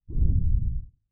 monster creatures large single footstep lfe horror